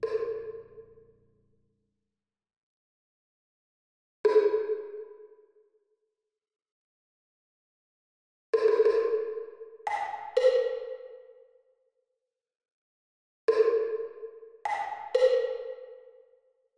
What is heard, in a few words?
perc